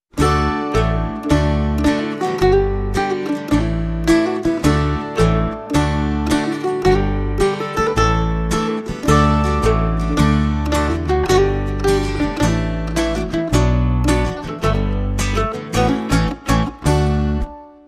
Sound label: Power Gardening, Reduced